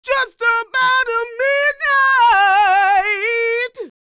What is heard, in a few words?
D SING, Vocal R&B Dance; DB DANCE